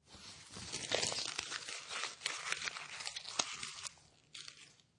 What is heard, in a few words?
Crumble Paper, Crunch paper, crumble paper in hands.; Paper Sound Effects; Paper (Sound Effects:Materials)